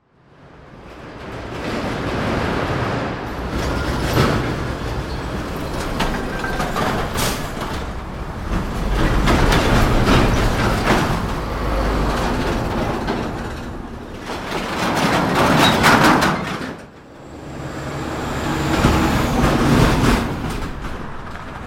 Heavy Traffic Trucks Highway

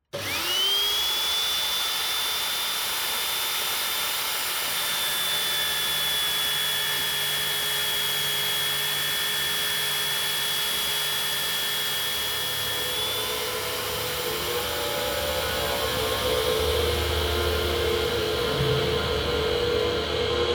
INDUSTRY

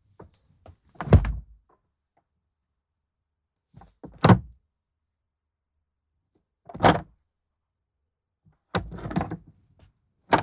tools

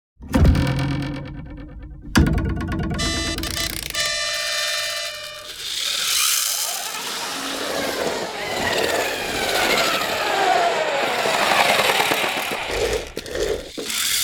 toys

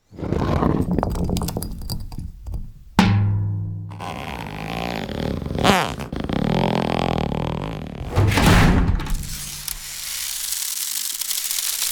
materials

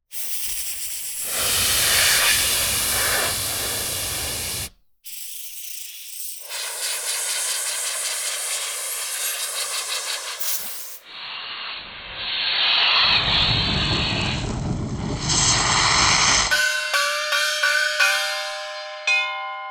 materials

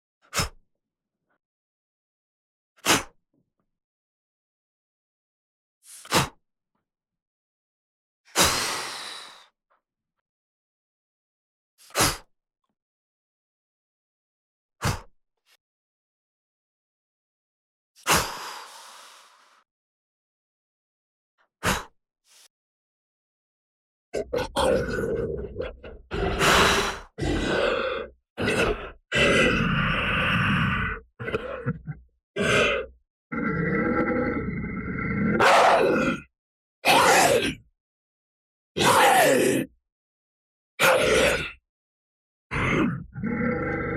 foley creatures

